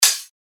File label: HiHats